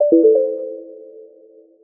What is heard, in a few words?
battery critical; Linux; Celestial 2.1